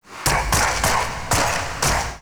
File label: Steps; Emissary Season Two; Zeal Pre Teleport; Cinematics